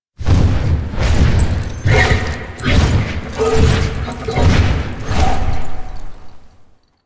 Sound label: Horse Glide Wing Fly Forward; Vehicles; Duviri